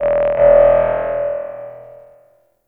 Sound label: Ominous Techno Hit; Ambience; Warframe; Corpus Railjack